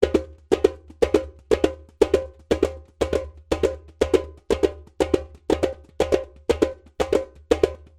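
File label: djembe melody rhythmic 120 beats measured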